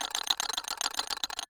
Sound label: Mixing